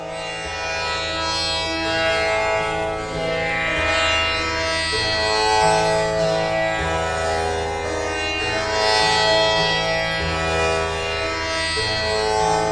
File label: Lalit, Far East Drones and Plucks